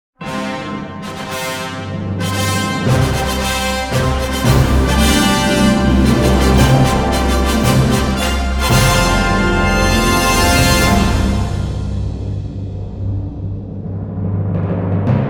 The Zeus Fanfares; Thor Love and Thunder

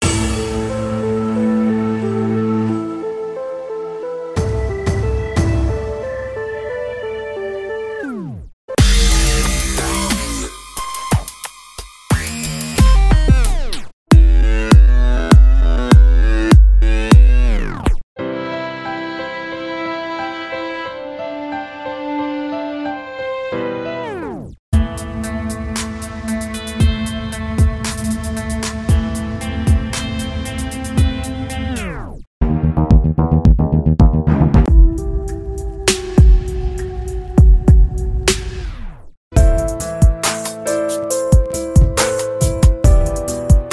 instrumental, Persian Music

